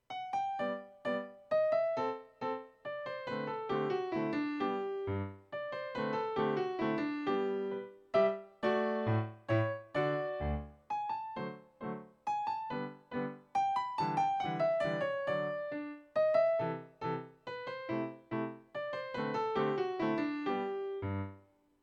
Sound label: Minuet; Haydn